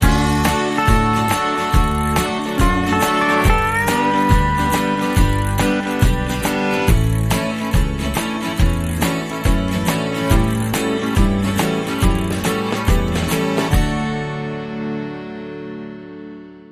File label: Casual Cajun Charm; Sting